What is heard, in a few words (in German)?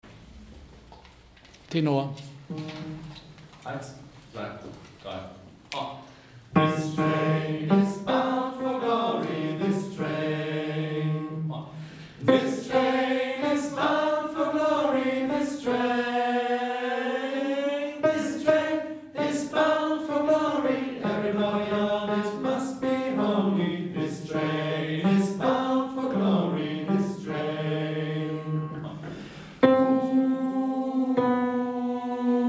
Tenor; This Train